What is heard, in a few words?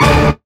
old skool stab